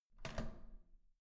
door handle down

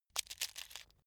match take out

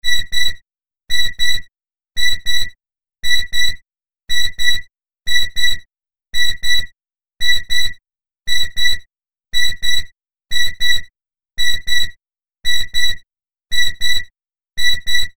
med beeps

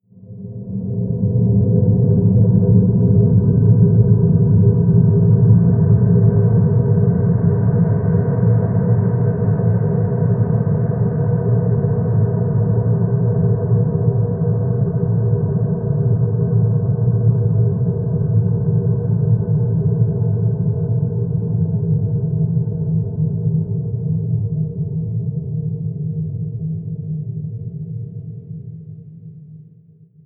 drone noise low

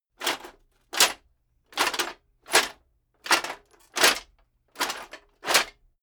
metal plate armor movement sequence

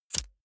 lighter wheel spin flame burst

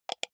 computer mouse wheel click fast

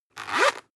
zipper close fast

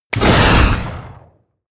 artillery missile truck fire

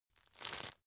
tissue grab

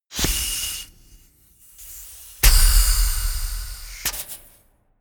whoosh air

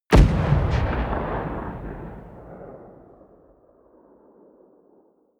missile medium explode distant

